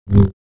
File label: Saber; FXHome